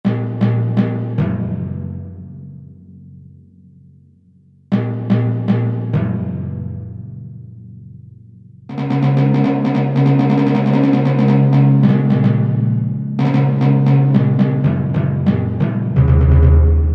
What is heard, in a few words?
Timpani, Korg T3